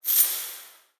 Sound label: UI Production Cycle; Taps to Riches; Android